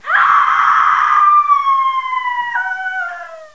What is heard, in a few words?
fall, female